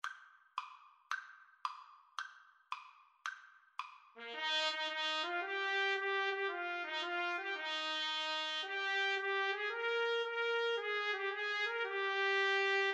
itsy bitsy 2 TPT

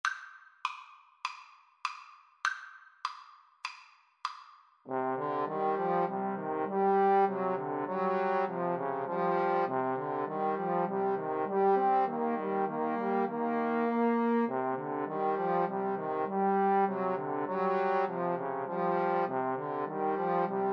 trombone trio

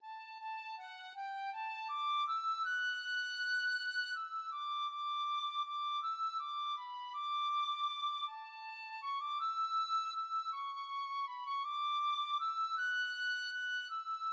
in the garden, tin whistle

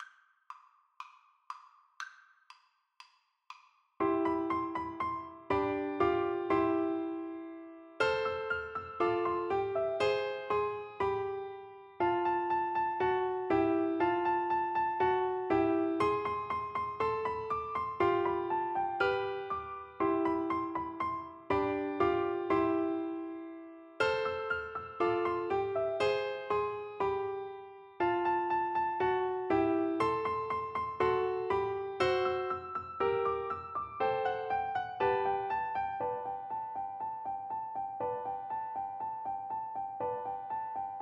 Piano Duet

